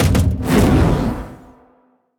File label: immolation cast; heroes warhead